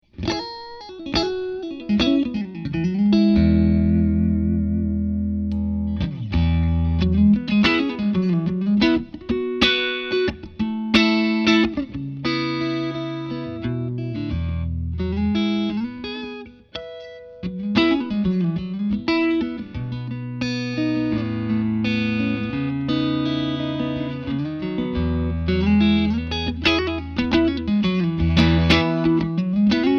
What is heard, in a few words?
Strat Clean; egnater